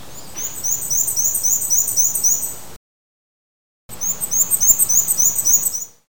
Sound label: Black and White Warbler